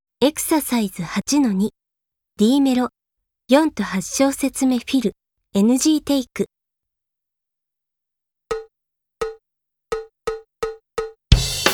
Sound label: Mellow 4, 8 Fill NG Take; D Mellow 4, 8 Shousetsume Fill; K-ON